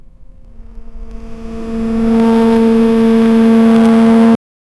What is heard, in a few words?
fast fpv drone flying